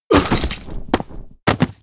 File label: Mob die; Monster; 욱일승천류; 천풍단주